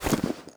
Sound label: shove